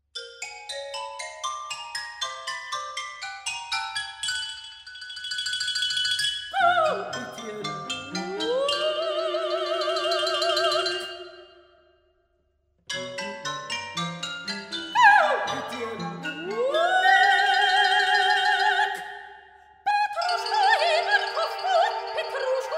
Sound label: soprano and instrumental ensemble